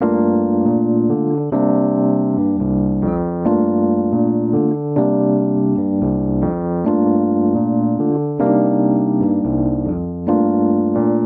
Vamp Soulful i - iv G Minor 70 bpm, Electric Keyboards; Ableton; Factory Packs